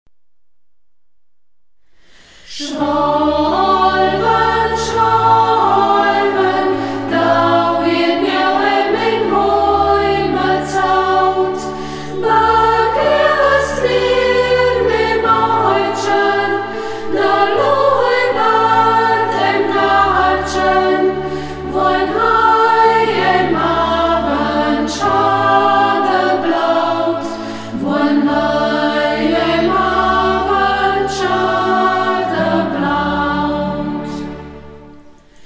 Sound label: chor, mundart; Burgberger Chor; Ortsmundart: Burgberg; Schwalwe, Schwalwen (Hiemwih); hegt wird gesangen